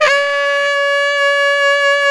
SAX Baritone SAX, FX

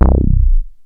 HIP BASS, HIPHOP; Rubber Chicken Software Sample Bank

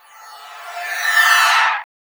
VANISH; Spectrasonic Distorted Reality 1

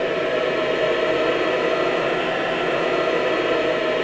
AVANTE G, Voices

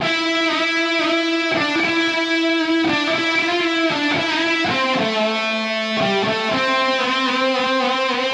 D Phrase Power Riff; Zero-G; Future Wave

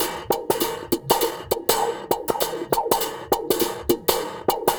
Spring, Percussion Loops; Ambient Grooves

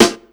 ALA SD; ALAKIT; USB Soundscan vol.31; Miro s Grooves; AKAI

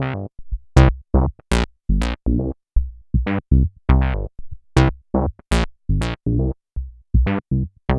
Bassline, Prototechno

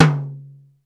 BEAT KITS, T; Crypton - DrumBase5000

